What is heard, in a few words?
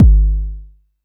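vermona drm1 mk3 bass drum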